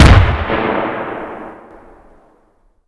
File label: Inf Weapons; Red Orchestra 41-45